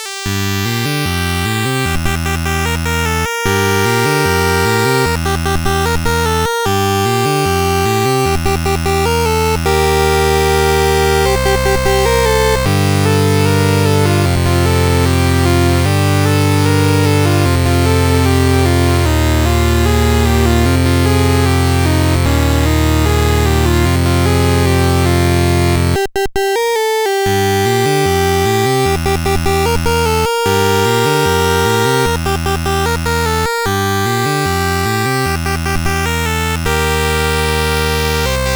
sid, CSG8580R5; Escape from New York

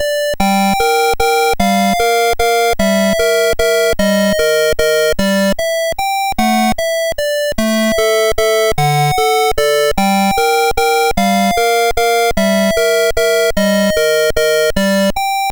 sid, MOS6581R2; Berks Four

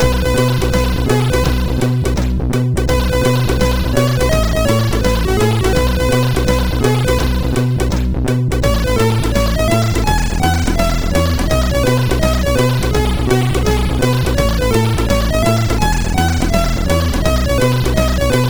Reggae Example, MOS6581R4

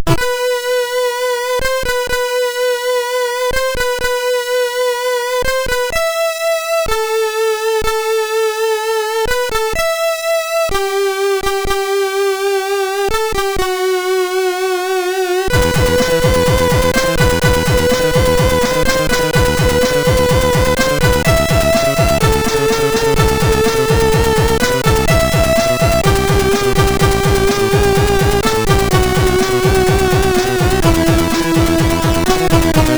sid MOS6581R4

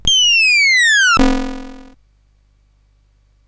sid, CSG8580R5; Blueprint

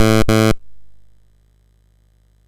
sid, MOS6581R4; Classic Concentration

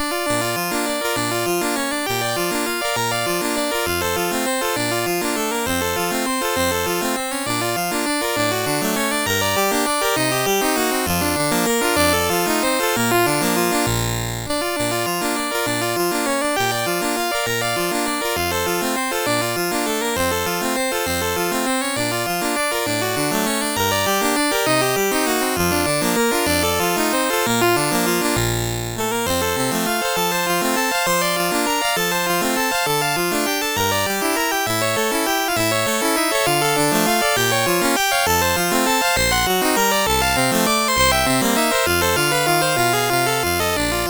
Allegro in B Flat, MOS6581; MOS6581R4

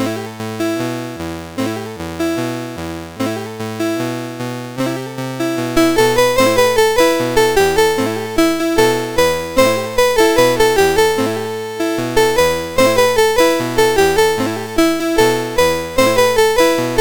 Happy Birthday, MOS6581R4; Quantum Link

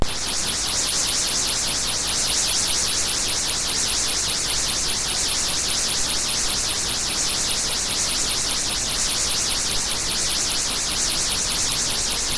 sid CSG8580R5; California Games

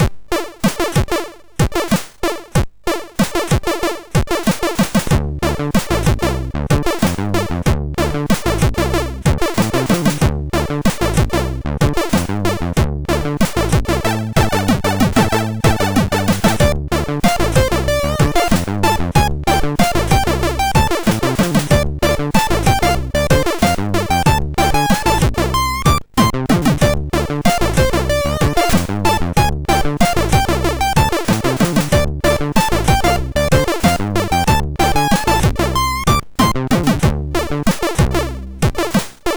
MOS6581R2, sid; Waxing

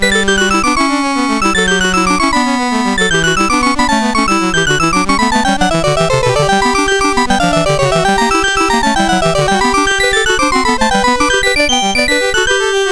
Variation, MOS6581R4; Goldberg Variations

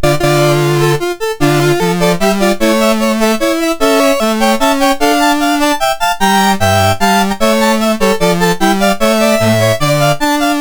Corrente, MOS6581R4

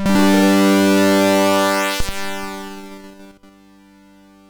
sid MOS6581R2; Prince of Persia

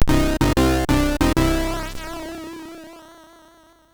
Lights On, MOS6581R3

